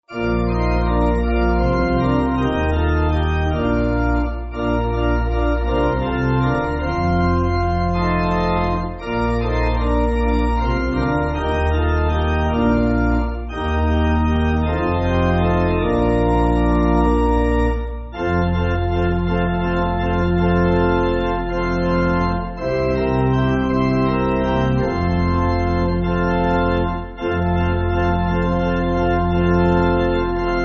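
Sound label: Pipe Organ, Backing Files for Churches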